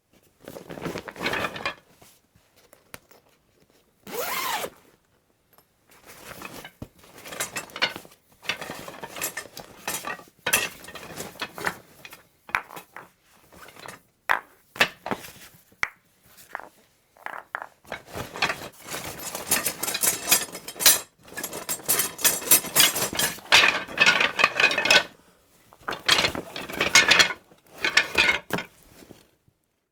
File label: croquet bag rummaging